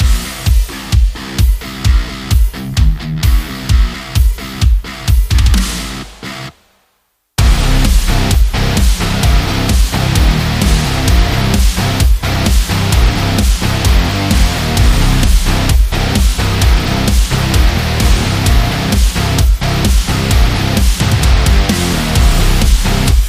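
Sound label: Harder Rock, high-energy background music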